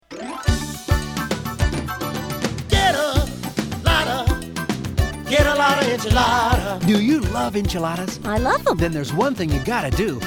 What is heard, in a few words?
Jingle; TacoCabana